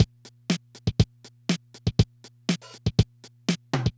Loop, dd-5

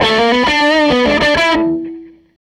SG Guitar Loop

